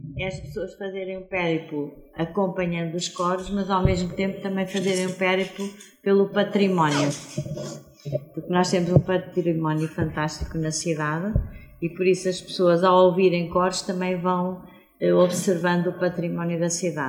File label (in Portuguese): CORO